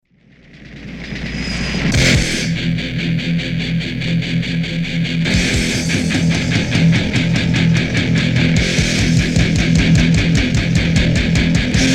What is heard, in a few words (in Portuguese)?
Guitarra; Spots Especiais